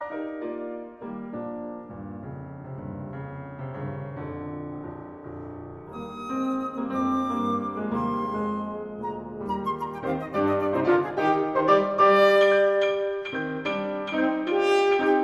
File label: for flute horn and piano